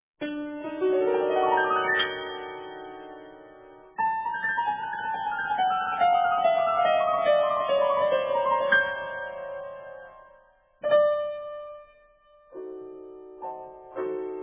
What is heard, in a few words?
piano works; SMETANA; KOMPLETNI KLAVIRNI DILO B SMETANY; Macbeth and witches